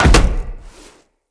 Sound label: land heavy glass, footsteps; Characters; Tenno